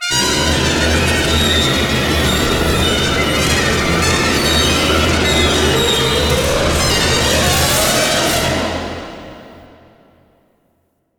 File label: Urgency Stinger; rise of nightmares xbox 360 gamerip 2011